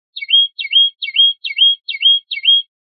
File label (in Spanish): rep primaveras, pardillo